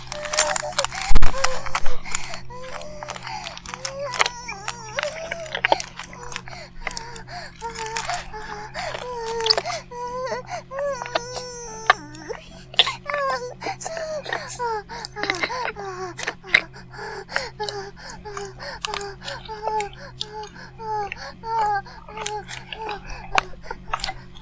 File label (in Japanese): バイブ放置; イクたびにわけて